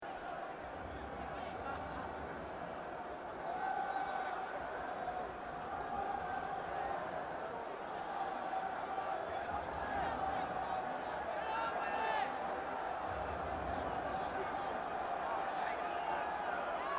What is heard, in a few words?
Sport Crowds Rugby Football Large Stadium Shouting Chanting And Crowd Walla; Tannoy Anouncements; Tom Hutchings